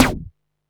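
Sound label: MISC Right, Techno 138 BPM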